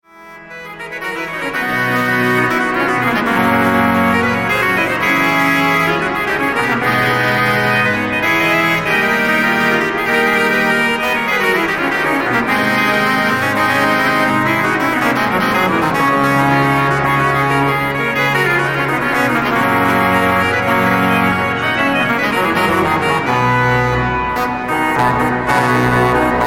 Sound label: Battaglia; Canarias; Anonymous - Batalla famosa